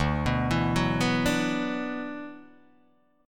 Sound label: D 6th Add 9th D, Guitar, Open D